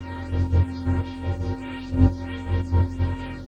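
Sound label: Pad Synth; Equipped Music; Loungin House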